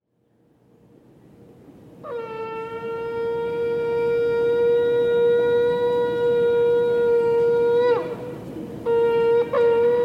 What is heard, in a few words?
Outdoor Shofar; FSU Chabad